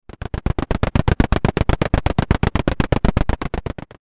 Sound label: Old machine